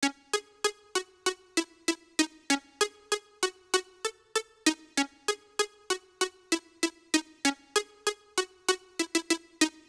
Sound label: Latin HipHop Synth C#Min; Digidesign; Pro Tools Essential Loops